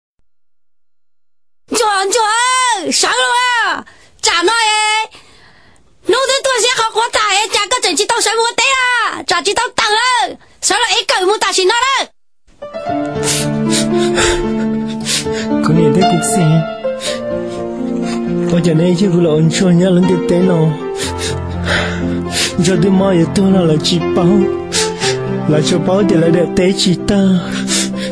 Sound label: Hmong